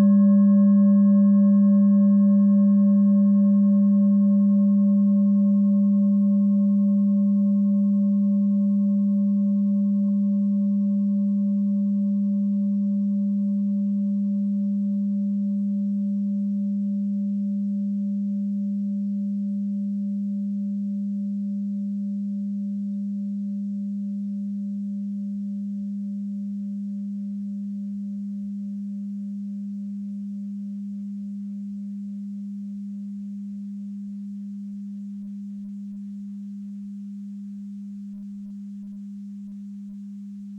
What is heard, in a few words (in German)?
klangschale set; Gewicht = 960g; Durchmesser = 18,2cm; Höhe = 8,9cm